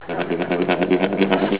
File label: bb trombone; bradybunch